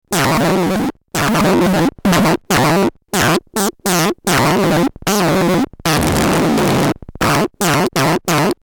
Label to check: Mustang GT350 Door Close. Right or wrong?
Wrong